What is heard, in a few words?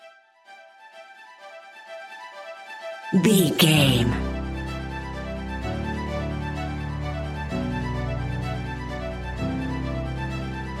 bright future